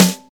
Focused Snare Sample F# Key, steel snare drum sound tuned to the F# note